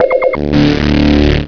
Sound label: suit charge ok